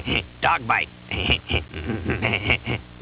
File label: dogbite